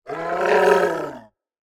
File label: MONSTER Yeti moan